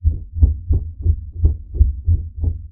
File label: footsteps